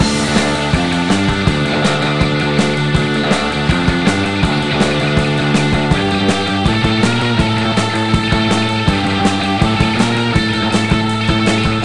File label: Music Beach; Beach Buggy Blitz; Android